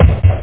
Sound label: hard bump